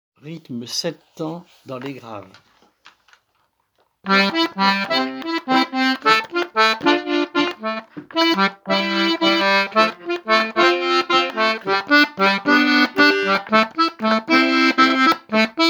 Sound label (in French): Rythme 7 tps grave; AkoOdamVoBitola